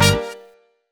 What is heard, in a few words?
backing, Disco; Best Service ProSamples vol.48 - Disco Fever; AKAI